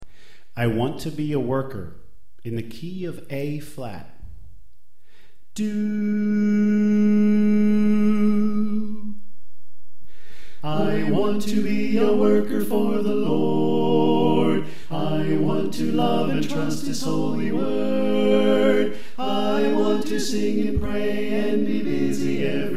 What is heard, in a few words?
Bass Left